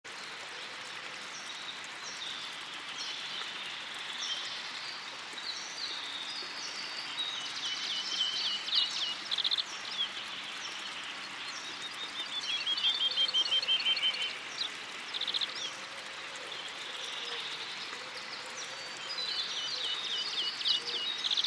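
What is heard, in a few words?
forest ambience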